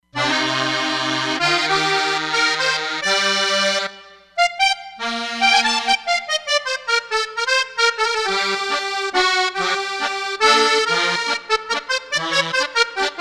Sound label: Guinguette, Roland Fantom XR